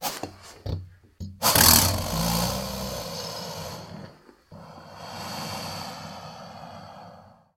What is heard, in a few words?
Alligator Snort Brea